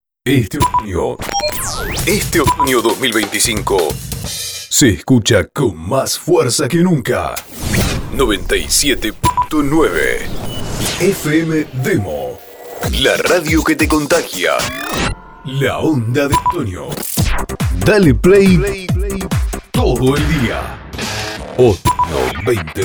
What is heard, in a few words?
POWER fx; otono